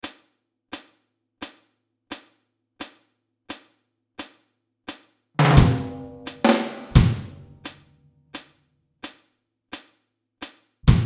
Drums

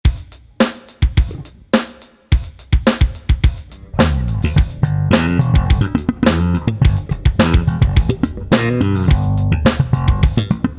SLAP

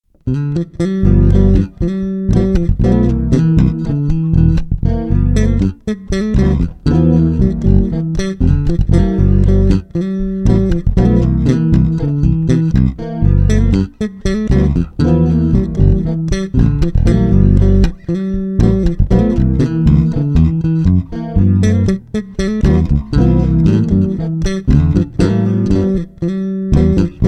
CHORDS STEREO EQ PITCH DELAY ALL BASS PARTS; Suhr Classic J oly white

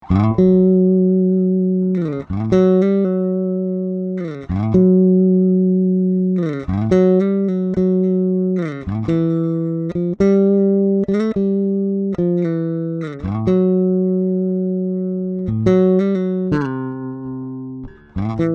BLUES MELODY

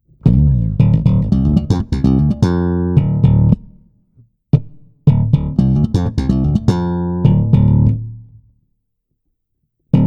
slap, bass

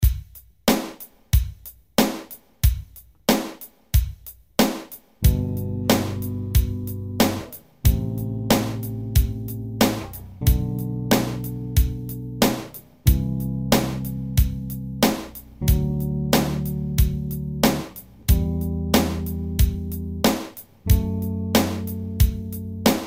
Chords

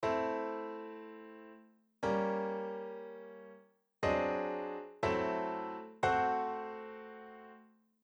Funky Chords

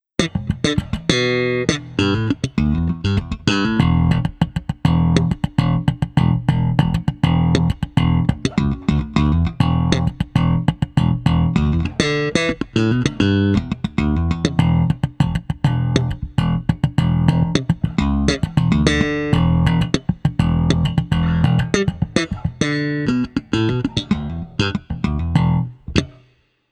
Original Bridge Passive Aguilars; king koa

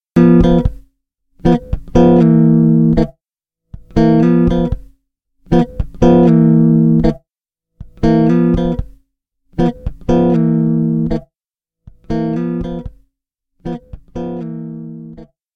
chords mono; Suhr Classic J oly white

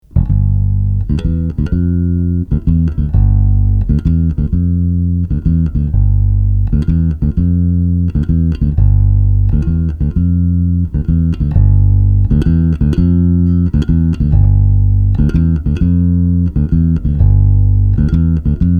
bass line

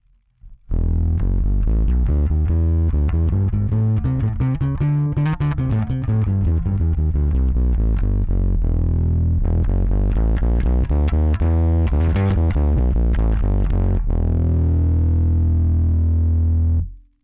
bmaxt passive gain5, bass; bbe bmax preamp

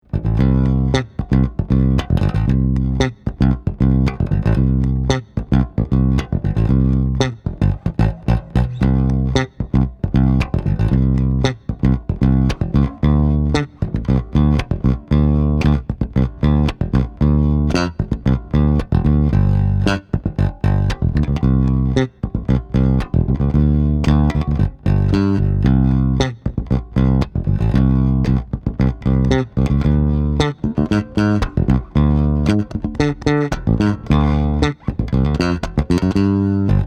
bass

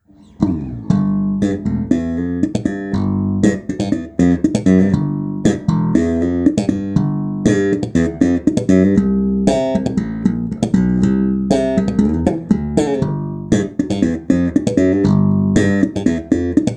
slap bass; olympus

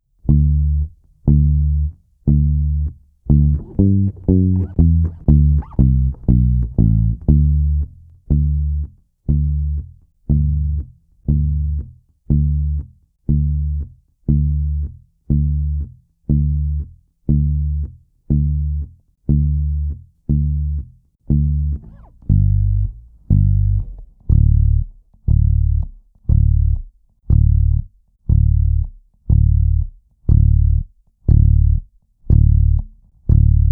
BASS

